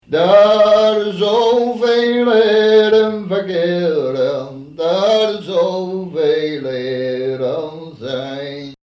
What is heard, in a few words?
Singing Example